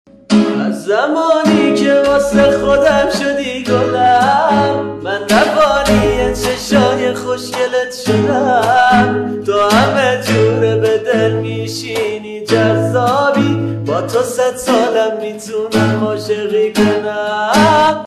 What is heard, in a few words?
Almas (Guitar); با گیتار کامل; تند بیس دار